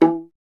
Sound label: STR VLA, Solo Instruments; Miroslav Vitous; Solo Instruments VOL-1